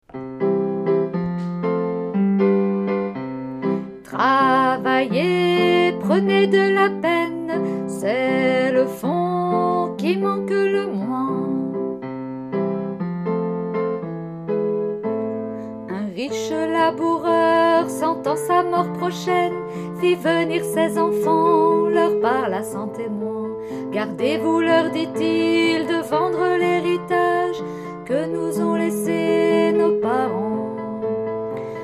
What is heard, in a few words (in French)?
Le laboureur et ses enfants chanson